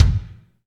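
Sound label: bass drum, BD Real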